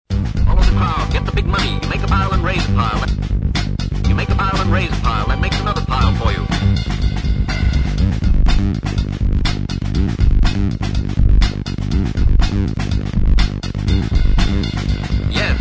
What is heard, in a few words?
groove test